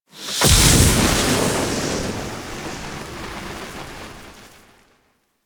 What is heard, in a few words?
Closet Fire Burst; Warframe; Entrati; Necramech Hack